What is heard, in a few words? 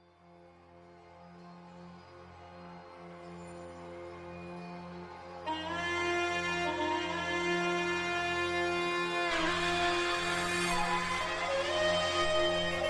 Old Ukrainian folk song (SLAVIC SONG); Ой, на Івана, та й на Купала